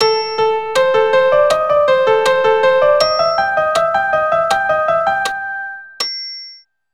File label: Sixteenths, keyboard